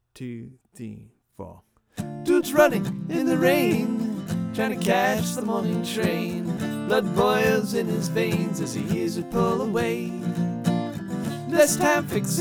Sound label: verse harmony example